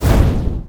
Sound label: Holy Blast Shoot; Providence